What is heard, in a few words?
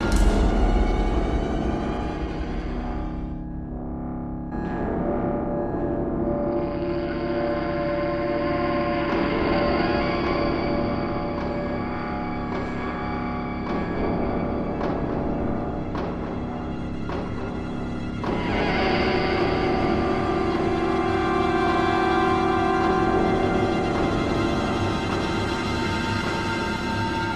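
Music FIGHT INTRO Loop; A4 MEGATRON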